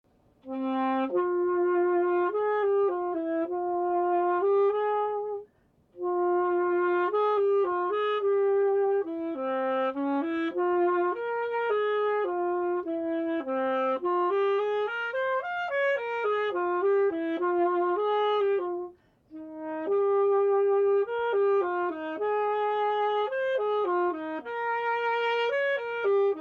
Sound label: Alto Sax Etude in D minor